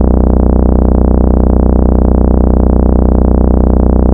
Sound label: Root B, Ultimate Bass; Vengeance Samples